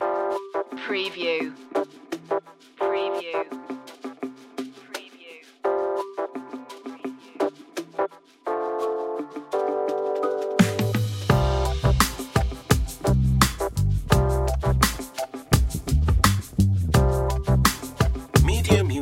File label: easy hip hop